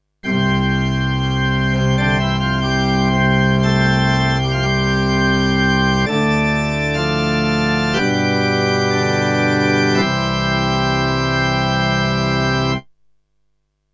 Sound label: Alesis Concert Church Organ